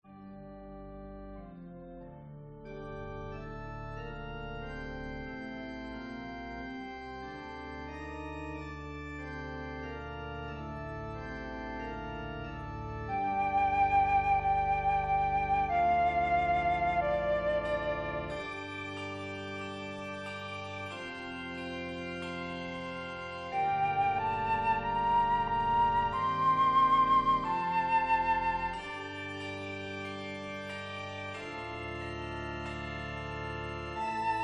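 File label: Intro handbell C instrument Organ Flute; O Dearest Jesus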